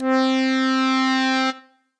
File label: Capri Mini Organ; Instruments; Lost Keys; Ableton; Factory Packs; Lost and Found